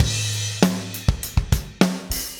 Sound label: Drums, Rock